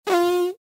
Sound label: Fart air noise